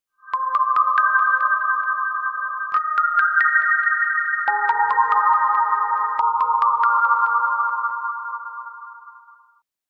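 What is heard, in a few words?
funny standard sms funny standard ringtone sms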